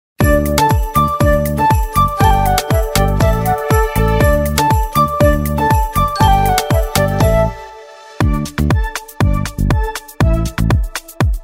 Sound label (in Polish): instrumental, wersja instrumentalna / karaoke; Wersja instrumentalna do piosenki; Krakowskie Centrum Artystyczne